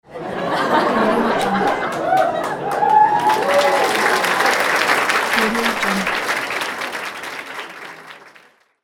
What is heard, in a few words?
crowd laughs and cheering